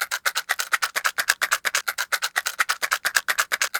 matches shake; best service Blue Box

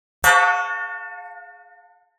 Metal Pan Ping Hit; Hollywood Edge; sound designer's toolkit v2

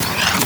Pickup Ammo; Pickups

